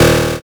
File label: zap